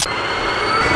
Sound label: minigun start; Soldat